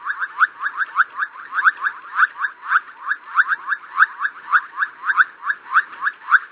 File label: frogs croak multi; DeadFright